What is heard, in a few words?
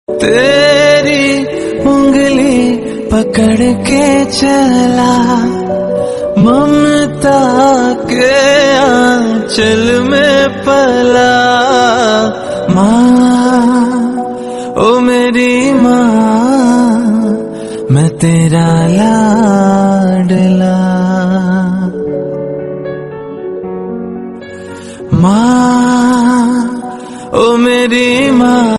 Birthday Songs In Hindi For Mother